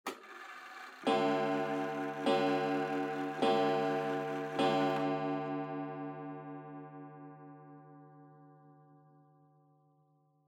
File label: Pendulum Clock Chime Long